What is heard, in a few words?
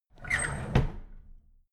drawer big squeak close heavy